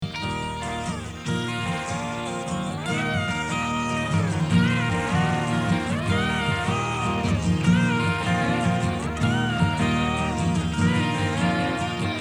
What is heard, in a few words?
Wah-Wah